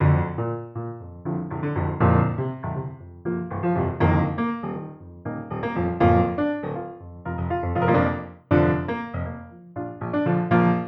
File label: Steinway; 8Dio